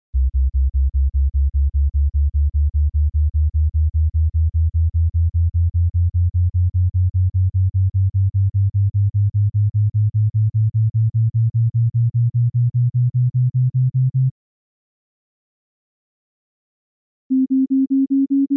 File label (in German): physiologische tonreihe